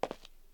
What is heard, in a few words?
footstep; gmtk24